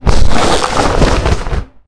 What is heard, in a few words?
linen; World of Tanks Blitz